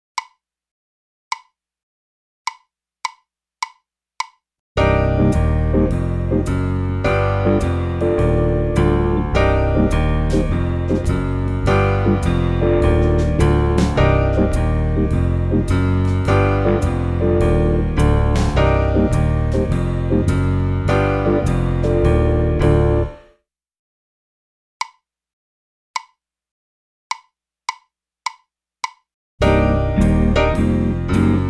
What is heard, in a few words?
Walking Blues Based Minor